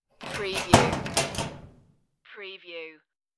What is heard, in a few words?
lock metal locker, the sound pack tree